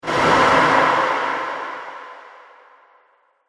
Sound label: Epic Sf Explode Impact; Sci Fi Sound Effects